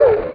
wolf hit; Undercroft; enemy

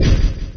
wall; Undercroft

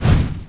fireball; Undercroft

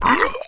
fat, enemy; Undercroft

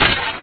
break vase; Undercroft

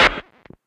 Transceiver; Mesukemo-Hime-no-Kaisuiyoku

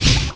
hit poison; Undercroft

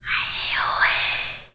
fairy; Undercroft; npc

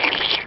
slime; Undercroft

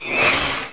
saw; Undercroft

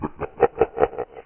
devil; Undercroft

